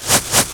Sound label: Salad Bag Shake